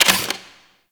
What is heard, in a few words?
Fist Movement; Enemies; Warframe; Tyl Regor; Fists